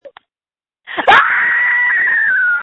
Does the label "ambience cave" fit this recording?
No